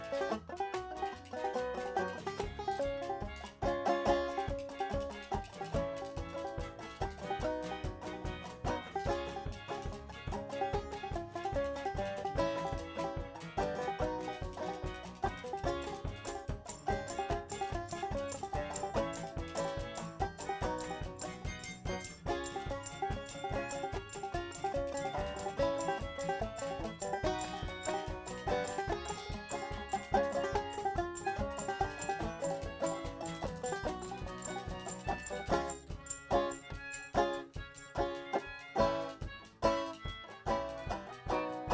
Banjo

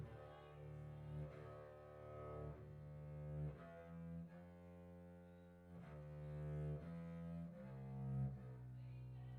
Banjo

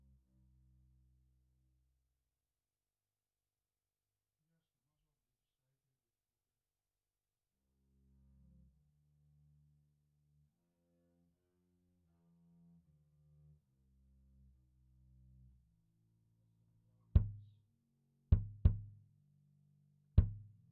Kick

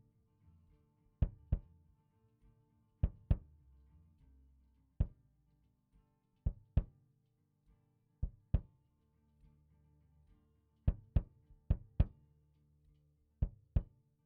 Kick

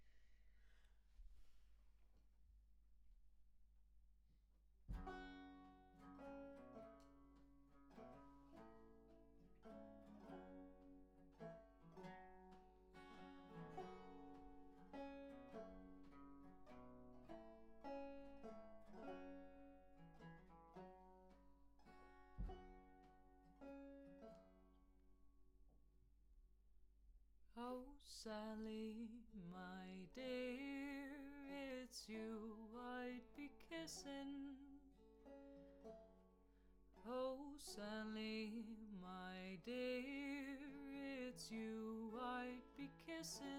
Voc Fig8

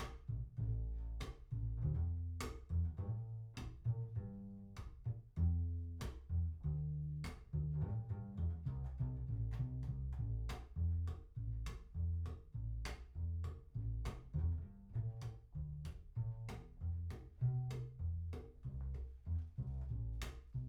Chordz

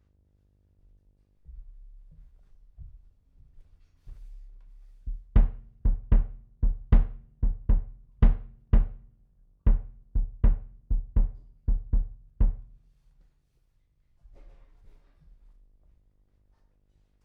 Chordz

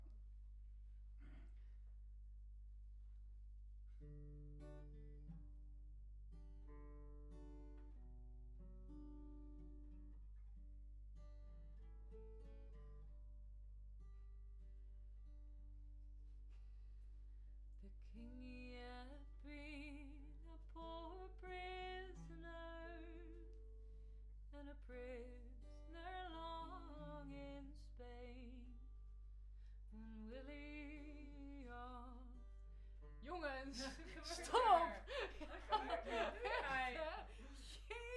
Voc Omni

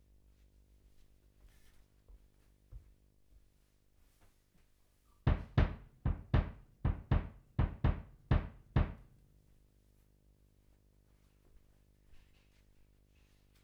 Banjo

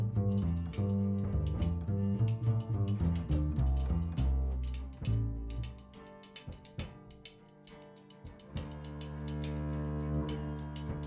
Bass Mic

